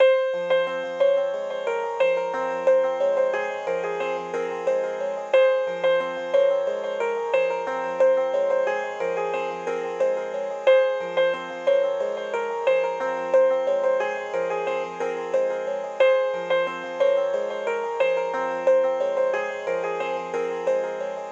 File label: piano arp 90